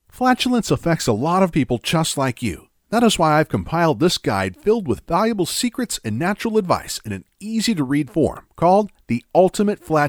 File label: Flatulence